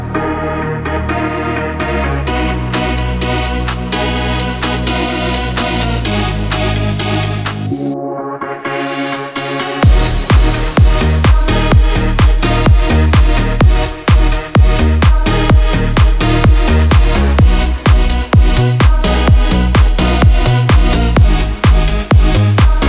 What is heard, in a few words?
Instrumental